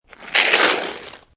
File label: Snow And Ice Ice Slide Pass By; Weather